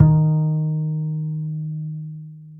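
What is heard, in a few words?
acoustic bass soft D#3, right; Masterbits CLIMAX 7 - Bass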